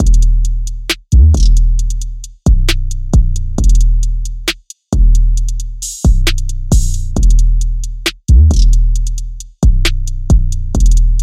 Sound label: Drum Loop 134 D